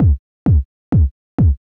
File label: 130 kick loop, 4 on the floor loop; Kicks